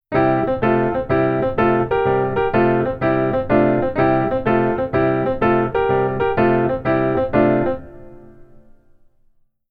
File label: Keyboard